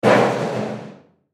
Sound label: Garbage Can Metal, Garbage Can Metal Impact Fall Over; Household; Blastwave